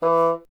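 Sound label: wind bassoon E; Miroslav Vitous - Solo Instruments VOL-1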